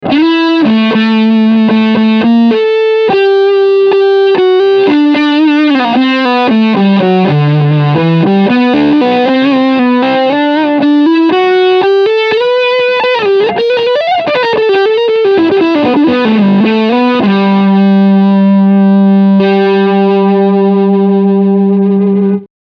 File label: Tube Drive Full Gain